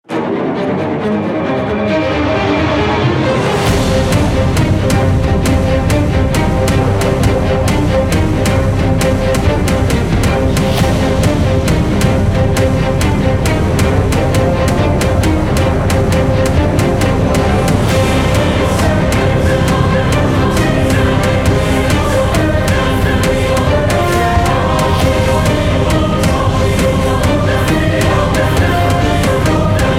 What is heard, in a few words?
stock music